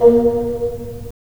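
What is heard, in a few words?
wind bassoon; LA Composer vol. 3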